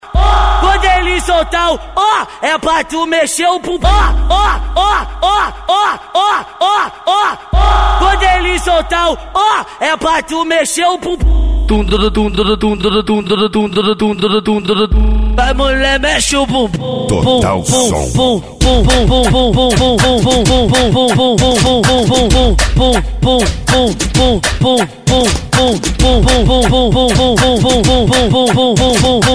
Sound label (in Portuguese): pagodao funk; total som; carnaval 2015